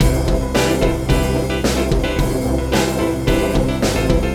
Vintage Drive snare body; SSL Fusion

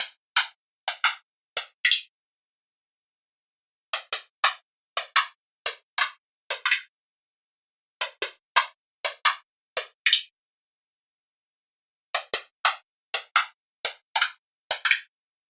DIVA preset Per HS Top Hat

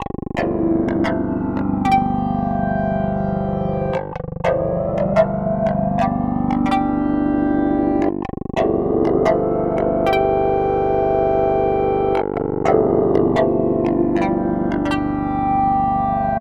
POLY SYNTH Vocal Clav; DIVA preset

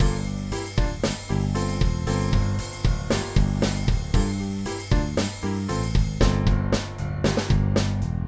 SSL Fusion Violet EQ off

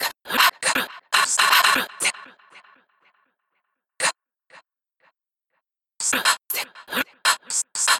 Glitch Inhale

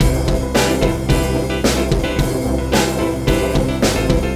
SSL Fusion Vintage Drive Parallel Bass Edge

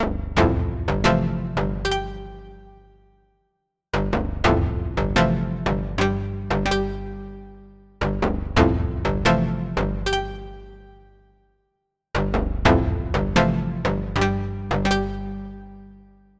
DIVA preset High Contrast Stab

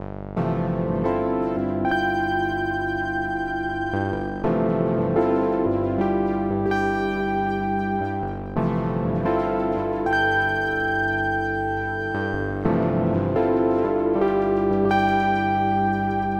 DIVA preset POLY SYNTH; 3EE All Aboard

